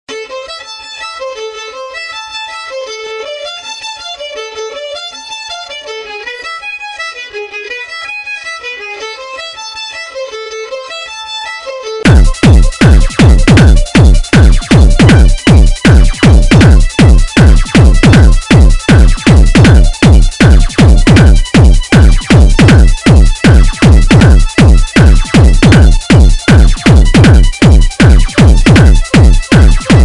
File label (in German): violine bass